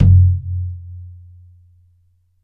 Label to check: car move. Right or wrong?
Wrong